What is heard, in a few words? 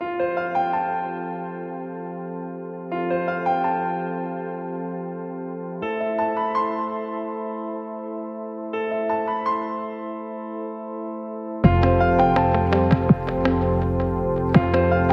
Mobile Ringtone